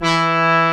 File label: OMNIVERB, ARP Synths, Analog Odyssey; E-MU Formula 4000 Series Vol. 3; ARP Synths II